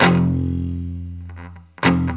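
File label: guitar chunk mute; Club-50 - Foundations Roland; Funk & Mute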